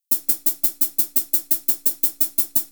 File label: Hi Hat